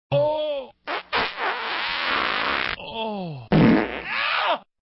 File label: fart and moan